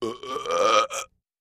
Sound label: Med Belch Inhale